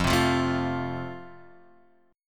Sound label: F Major, Guitar, Open F; strummed; chord